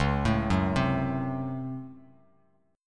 Db Major 7th Suspended 4th Sharp 5th Db Bass Standard; arpeggiated; chord shape; 9,12,4,5